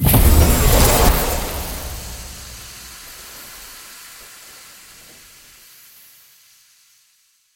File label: Explosion Ice; KriptoFX; Realistic Effects Pack v1; PARTICLES